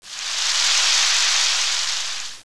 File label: Rustle